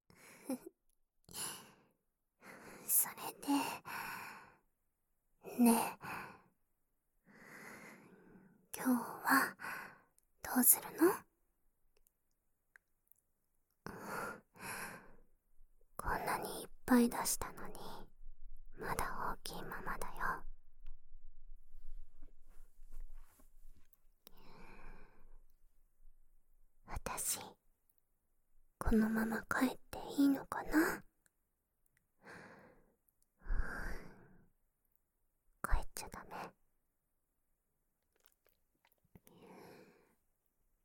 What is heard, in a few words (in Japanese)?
耳舐め, ASMR; ヌルヌル素股